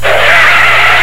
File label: screech